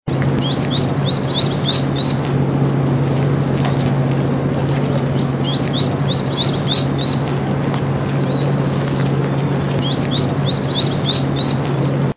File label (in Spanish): voz tacuarita azul